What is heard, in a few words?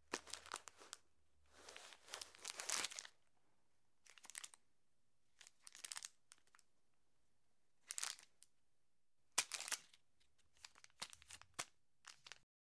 Light Plastic Moveme